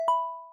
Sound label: ringtone